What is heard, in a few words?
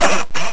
Time Out; MarkoSuominen; GraveYard